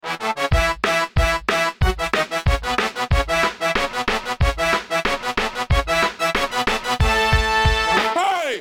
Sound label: win; EWJ; Iphone